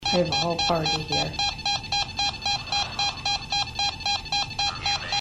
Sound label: breath near mic under beeping same section without removal